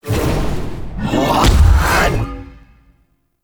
Rising Blades Cast; Pax Duviricus; Warframes

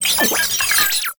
Sniper Drone Vocal; Enemies; Warframe; Lasrian

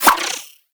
Skip Rope Whoosh; Warframe; Characters; Pets; Moa; Tenacious

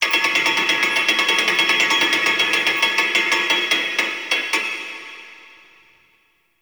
Vaults Grid Loop; Gameplay; Entrati

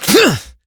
Adult Operator Melee Swing Exert; New War

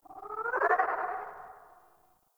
Ostron Village Birds One Off; Warframe; Eidolon Plains; Ambience

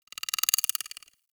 Foliage Insect; Warframe; Duviri; Ambience

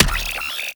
Drone Death; Warframe; Weapons; Archwing; Archwing Healer

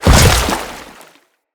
Land Water, Footsteps; Enemies; Grineer

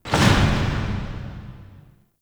Interior Orbital Station March; Warframe; Tau

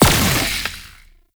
Pistol Projectile Embed; Warframe; Weapons; Tenno; Temple Signature Pistol

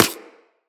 Laser Impact Generic

